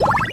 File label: balloon